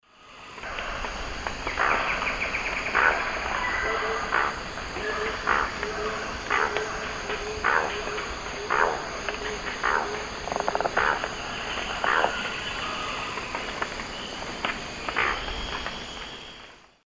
Selenidera reinwardtii, song; S. America; Eastern Lowlands

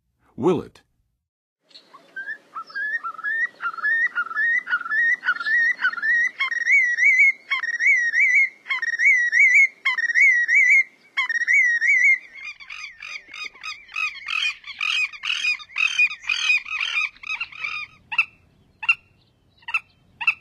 Willet, Birds of North America; Western USA; Western USA Songbirds

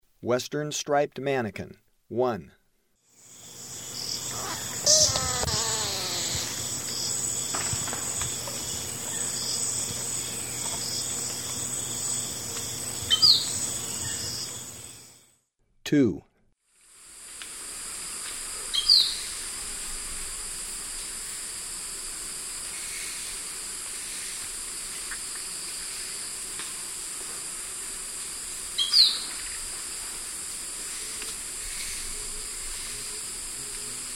Western Striped Manakin; S. America; Eastern Lowlands

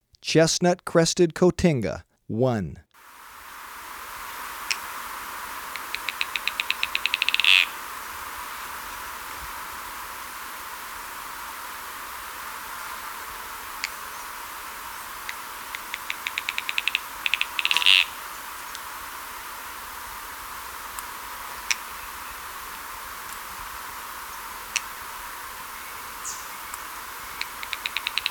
Chestnut-Crested Cotinga; S. America

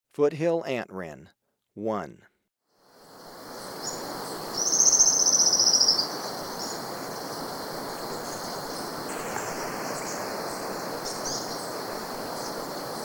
Foothill Antwren, Birds of Ecuador; S. America

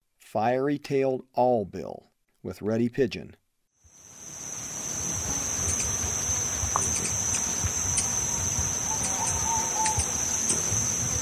Fiery-tailed Awlbill; S. America; Eastern Lowlands

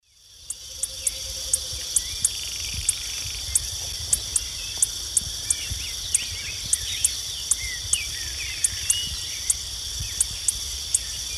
Volatinia jacarina, call; S. America; Eastern Lowlands

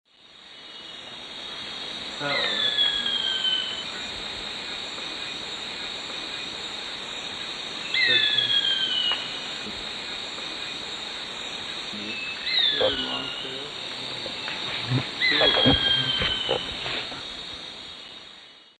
Buteo brachyurus, soar calls; S. America; Eastern Lowlands

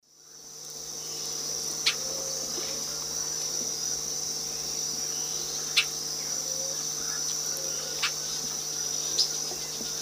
Dolichonyx oryzivorus, call; S. America; Eastern Lowlands

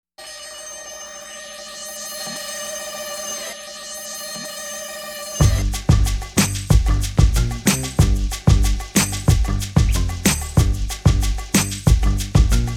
theme, reggae instrumental

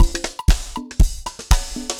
dancefloor perc 120 bpm

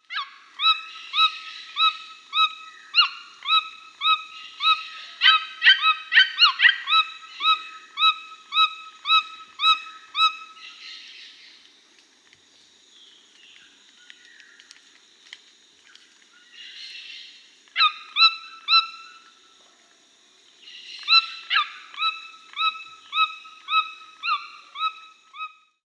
Red-billed Toucan (Ramphastos tucanus); Peru; S. America

